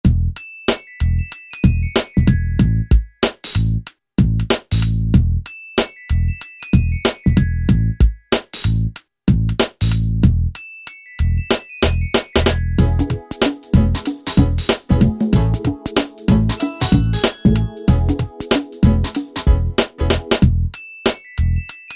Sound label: Yamaha DJX, Styles; Shakin'